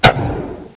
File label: light switch; ambience